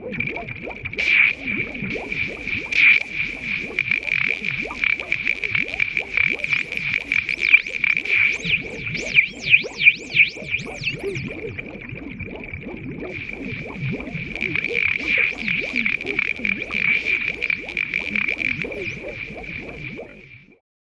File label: Static Fire; maudio; Hella Bumps